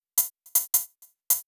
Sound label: HIHAT 160, Drum Bass; eJay